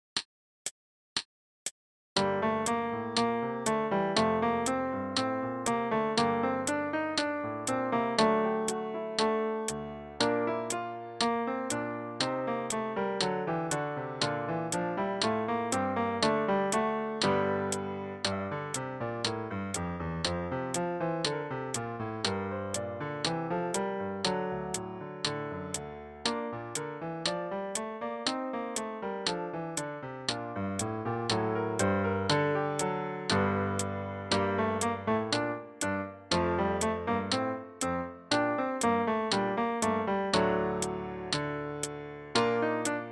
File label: full with solo